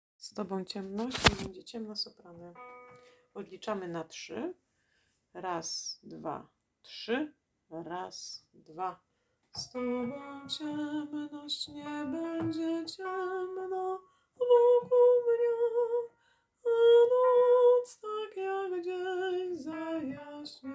sopran, Taize

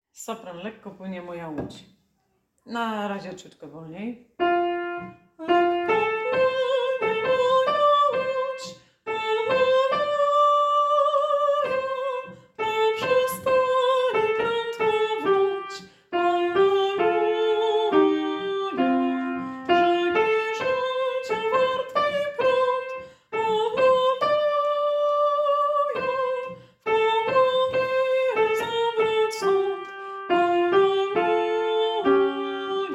Sopran; Lekko plynie moja lodz

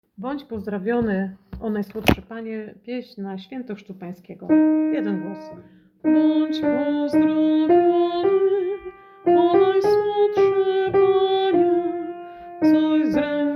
Badz pozdrowiony o najsłodszy Panie Sopran; Boze Narodzenie

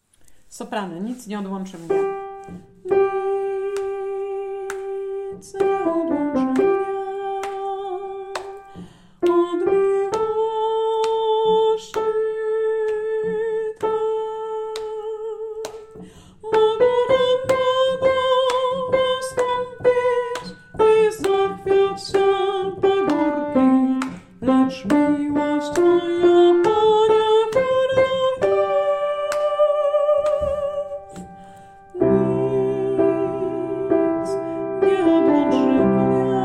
Sopran

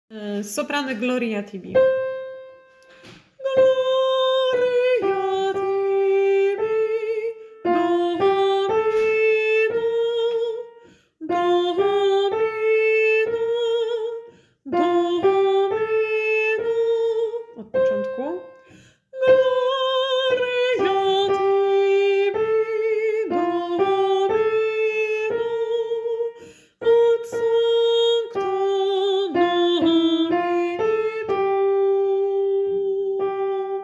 Sopran Cwiczenie; Gloria Tibi Domine

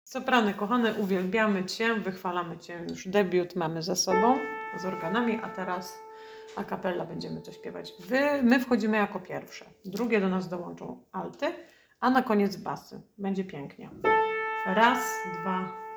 Sopran; Uwielbiamy Cie wychwalamy Cie

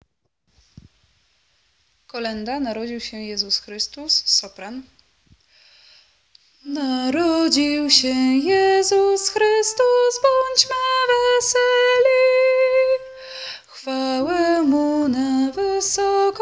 Narodzil sie Jezus Chrystus sopran; Boze Narodzenie

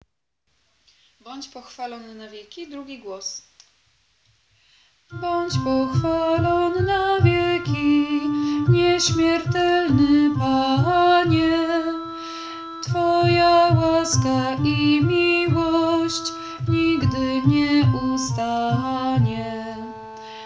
Badz pochwalon na wieki 2 glos

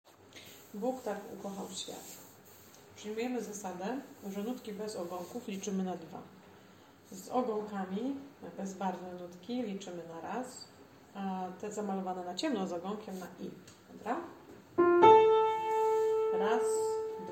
Soprany; Bog tak ukochal swiat

